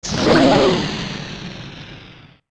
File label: Hit; Goht getting hit